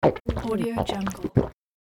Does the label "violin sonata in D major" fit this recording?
No